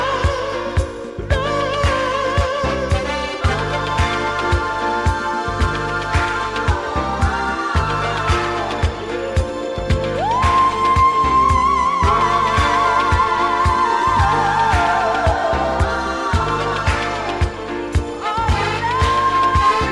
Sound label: 12 Inch Dance 80s Groove